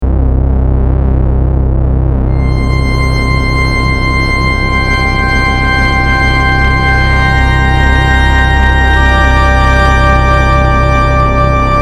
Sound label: Analog Bliss, Prophet '08